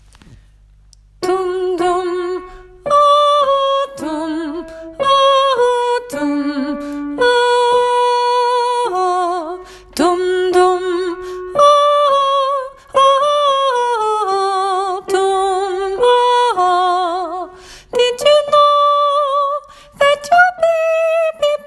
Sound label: mary tenor; åfjord